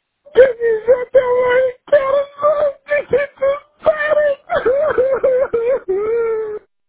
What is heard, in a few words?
crying